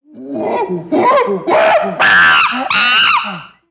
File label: Jungle Primates; gnarled forest